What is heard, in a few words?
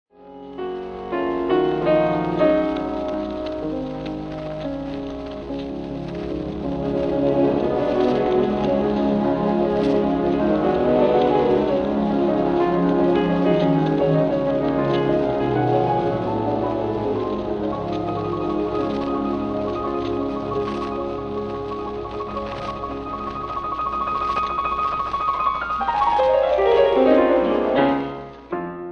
Piano Concerto Intermezzo Adagio, Philharmonic Symphony Orchestra